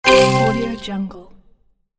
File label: coin sfx